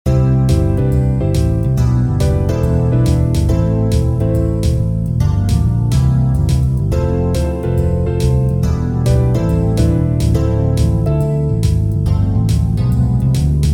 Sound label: jazz